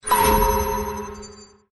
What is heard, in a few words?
Dice Move